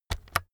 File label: keyboard external key press slow